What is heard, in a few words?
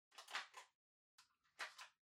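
Ceiling Light Far Remote Control Battery Insert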